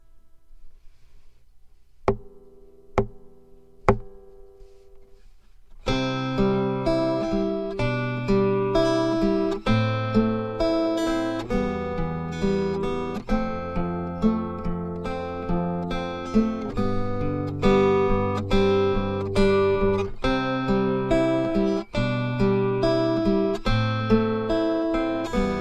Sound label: endpin, acpickup